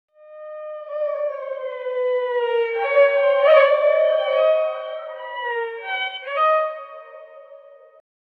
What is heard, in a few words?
echoing hoofbeats fading, horse